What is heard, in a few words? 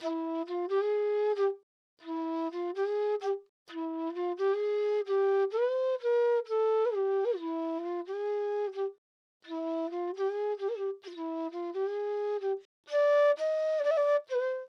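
Flute G minor 130 BPM, Dancehall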